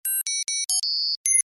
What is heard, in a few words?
beep yes